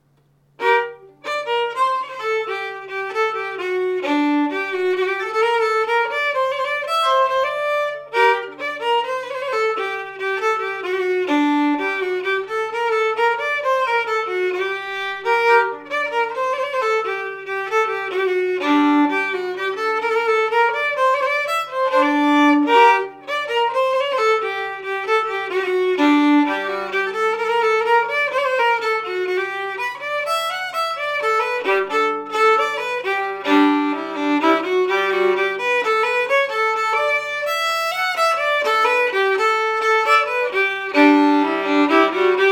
Kong homble melodi snabb

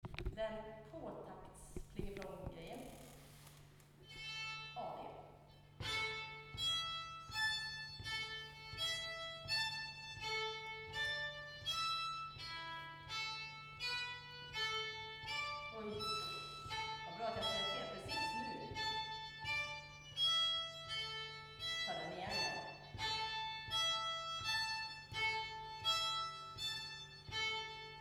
På och baktaktsplingeplonggrejer till Körepolskan

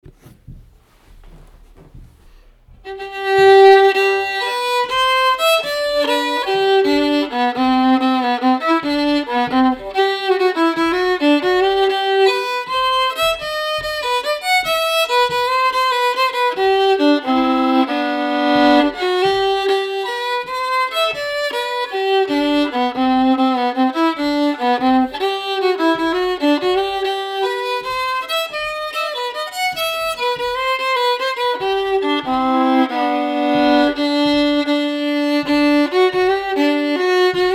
Jazzpolskan stämma

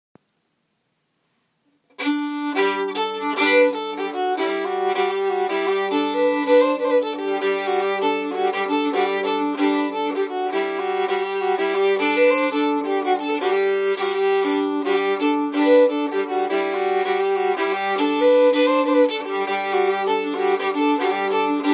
melodi; Grenseland

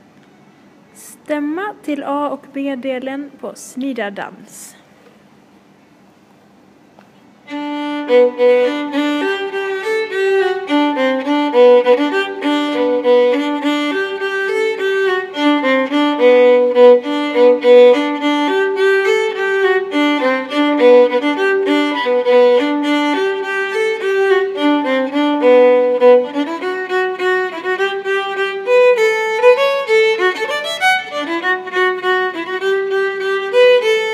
Stämma; Sniderdanz

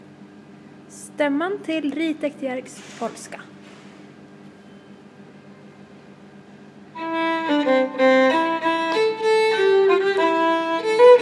Stämma; Ritäkt Jerk

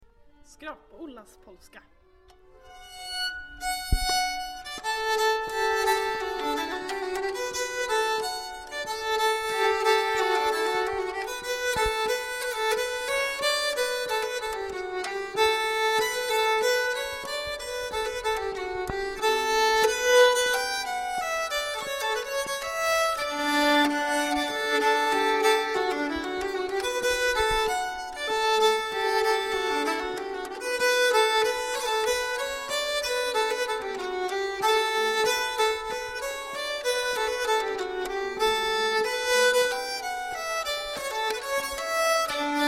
Polska; Skrap Ollas

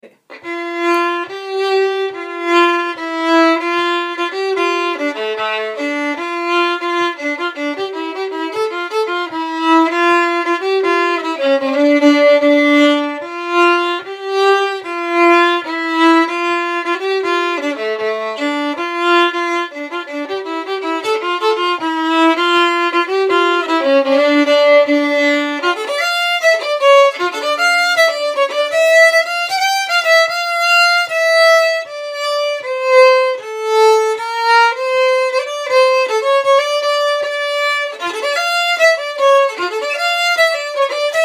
Shottis D moll stämma; Konsta Jylhä

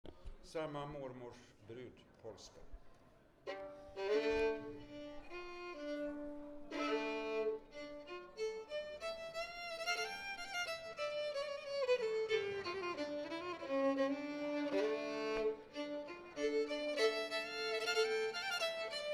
Brudpolsa; Mormors; efter Hedlund

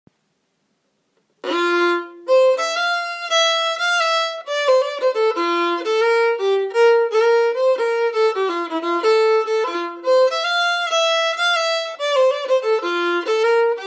Stämma

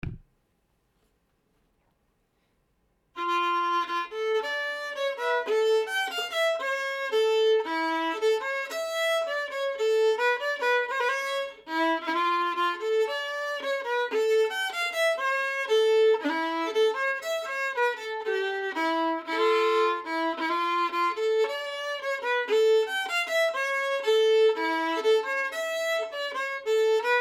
schottis stämma; Svens

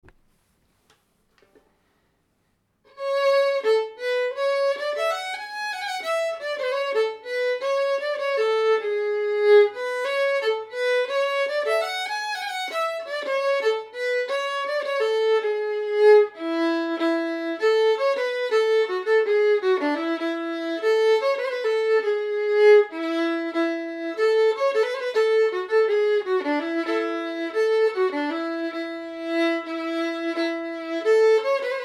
Stämma till Springlek; Omas Ludvig

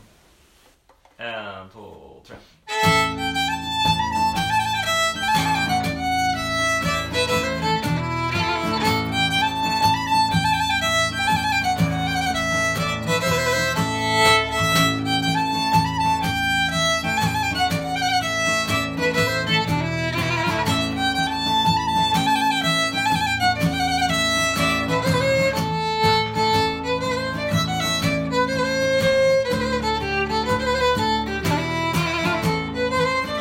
Polska; Från Frostviken

